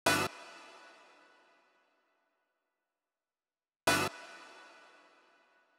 126BPM Cutted; Vengeance Samples; Tech Freak Kit

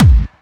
BD Punchy; Vengeance Samples; Bassdrums

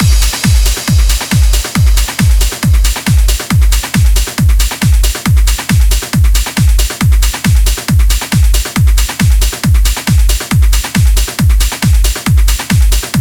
137BPM Drum Mix 16bars; Drums & Perc; Amajor

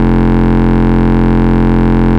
Root F, Bass

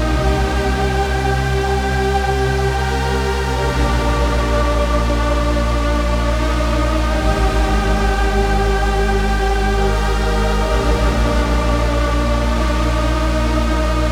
Melody 3, 136BPM; Alone Melody 3

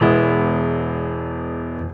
pno e, pianochords; house; Vocal House; PS-28 Vocal House Samples

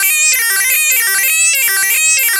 Arp E Line, ARP Odyssey; Lines; synth; musicradar-synth-samples

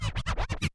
Transform Med, vinyl record cuts longs

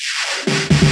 Fill Ins 128BPM; Vengeance Samples

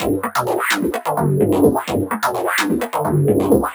VFX2 Loop 128 BPM

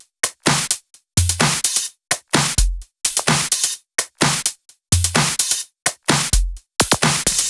Neon Drums 2, 128BPM; Vengeance Samples; Neon Kit

